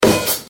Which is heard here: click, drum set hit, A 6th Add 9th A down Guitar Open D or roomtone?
drum set hit